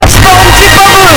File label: bubble